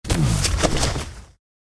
Tiger Die

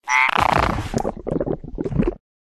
Pet Toad Die

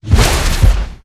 Dragon Block

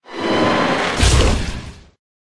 Pet Skull Die; Scull

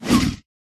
dodge; ukrotitelj

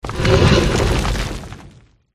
golem attack voice up

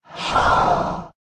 Dark Spirit Dodge

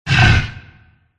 dragon blue wound

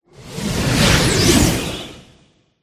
werewolf boss buff

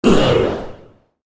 wound

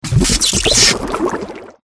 jelly fish death

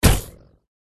jelly fish attack contact mid

